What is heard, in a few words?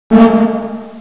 res spawn; matrix hl